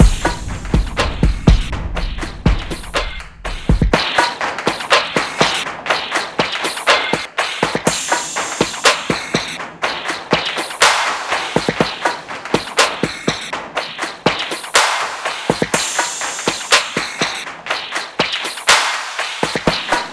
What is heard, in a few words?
allcomp on drums